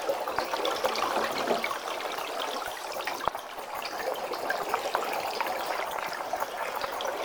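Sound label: Corrupted Sap Water Bubble Rolling Loop; Puzzles; Bean Stalk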